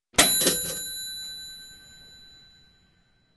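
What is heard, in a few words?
Toaster rings signal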